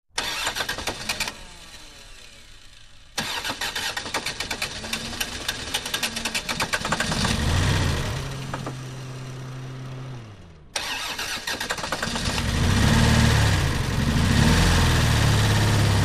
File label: Car; False Starts Then Turns Over, Labored Idle With Revs, Away Slow With Bad Lurching